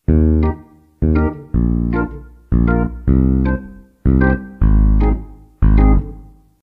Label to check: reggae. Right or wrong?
Right